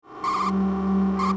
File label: Skid; Turbo